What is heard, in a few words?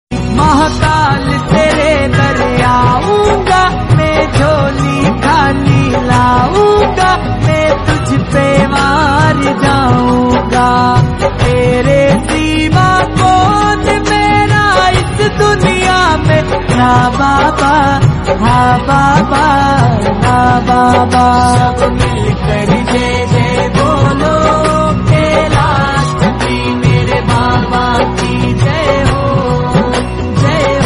Shiv Bhajan